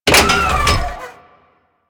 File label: Thumper Bot Leg Damaged B; Warframe; Grineer